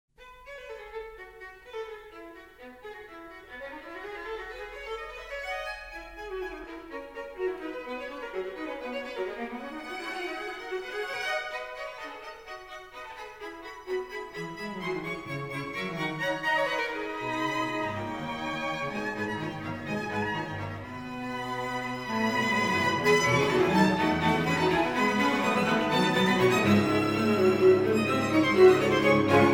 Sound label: quintet